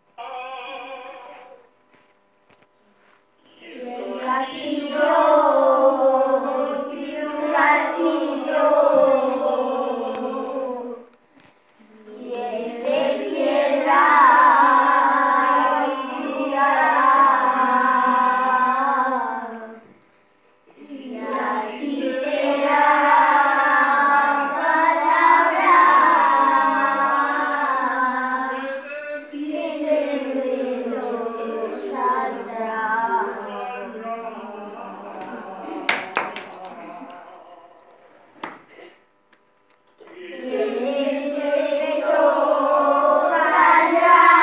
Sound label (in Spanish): JOTA DE UNCASTILLO